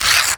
rip, present

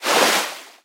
surf turn; talking tom gold run gamerip 2016

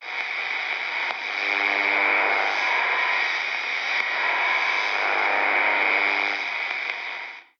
Radio Music; Radio Music Bank

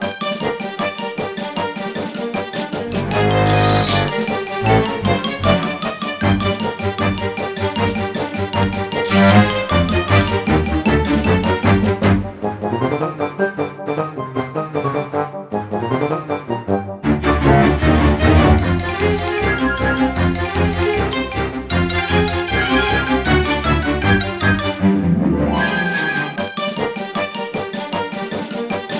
Tune Loop; Fable Heroes

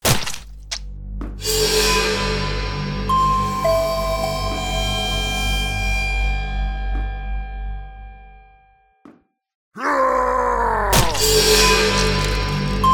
Boss Monster; S1 Mr Wong Wave

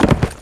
gallop, donkey

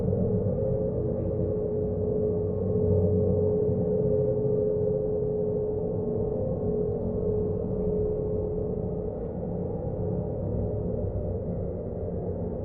Dark drone; The Joy of Creation Halloween

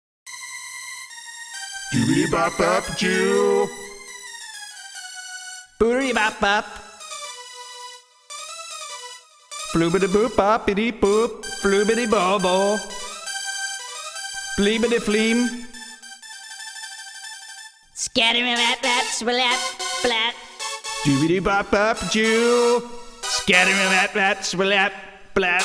Jazz Synth; Rick and Morty: Virtual Rick-ality; PS4

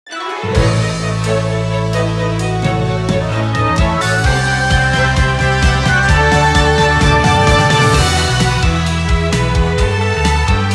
Music Event

